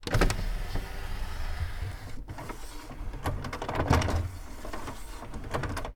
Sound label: entertainment unit - cd tray close; devices accessories utilities; NS Studios - ultra sound collection